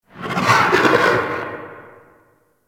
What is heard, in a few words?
Corpus Infested Distant Creature Snarl; Warframe; Ambience